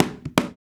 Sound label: African Perc; Spectrasonics; Supreme Beats; African Contemporary; Afro DRMs